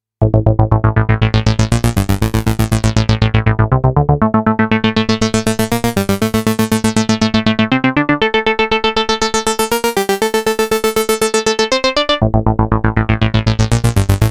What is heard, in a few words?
synth